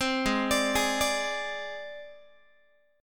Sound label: Ab Major Flat 5th Ab Guitar; AbMb5 arpeggiated